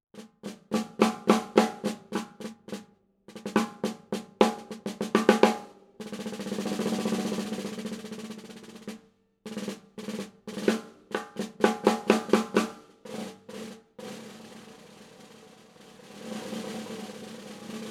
Slingerland 1960s 5x14 Brass Shell Snare - Sound King